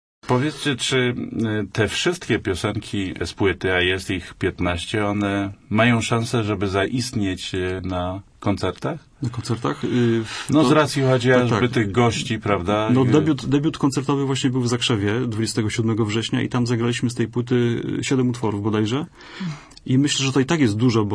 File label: blues flow